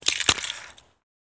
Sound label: ice attack